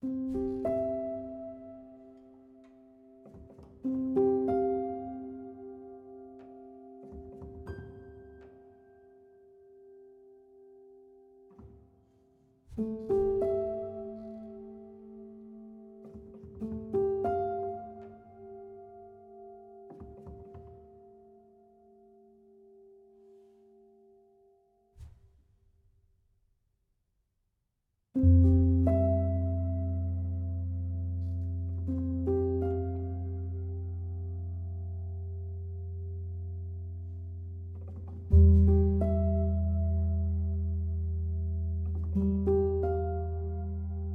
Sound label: river lowkey head 94bpm CMaj 92beats